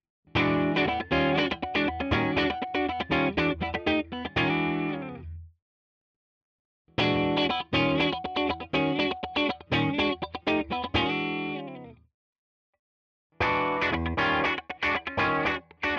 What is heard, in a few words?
clean Funk all positions; Schecter Exotic Ziricote